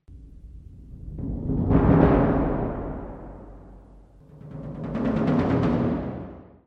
bombo

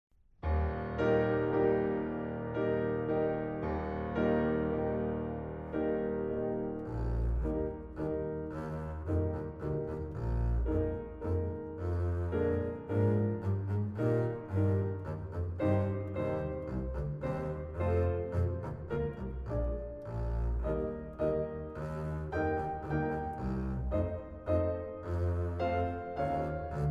Elefante contrabajo

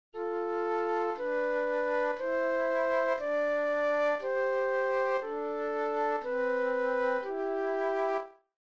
armonicos

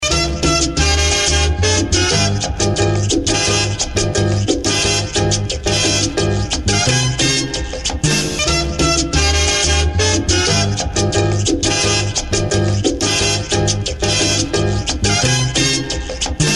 Güiro

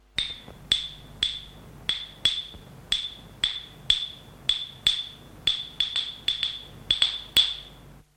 claves

